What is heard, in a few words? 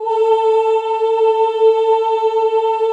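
choir A3, Ooh; Ooh 2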